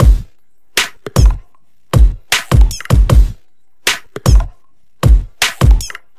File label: Loop 155BPM; Darnell